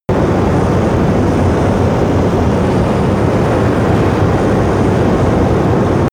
missile large fly loop close

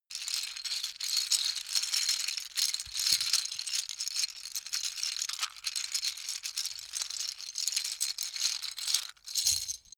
lego bricks handling sequence

